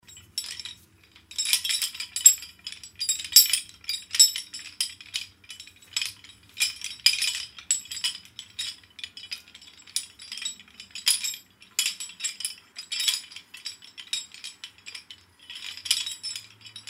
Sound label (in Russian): zvuk zvona cepei na zakluchennom; звуки тюрьмы